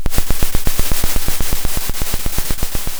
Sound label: pop, Sound of a drum roll